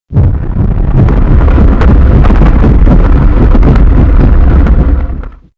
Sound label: Cog; Playable Tutorial Level